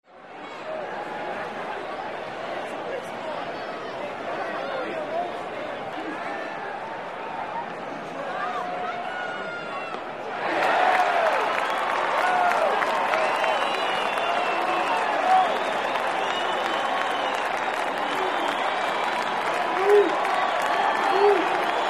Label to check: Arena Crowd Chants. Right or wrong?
Right